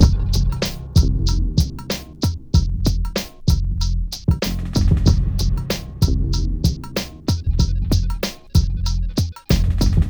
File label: Beat 095, Hip Hop